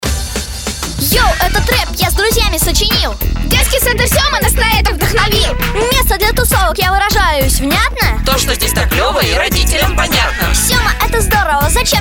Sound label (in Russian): vokal